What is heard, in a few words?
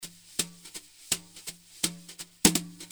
swing snare; Jazz drums